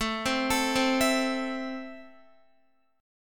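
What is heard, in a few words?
A Minor, Guitar, chord strum; JGuitar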